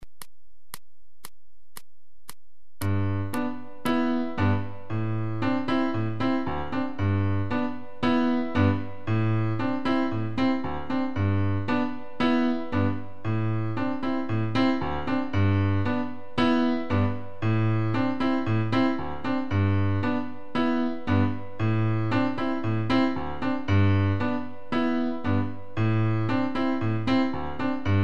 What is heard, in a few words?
Rumba; Seiber; Rumba Bis Walzer